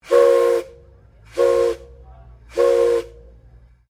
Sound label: Train Steam Engine Whistle Triple; Bellarine Tourist railway vehicles